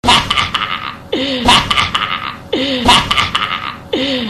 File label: hahaha